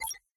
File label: code block processed success; Ben 10 - Omnicode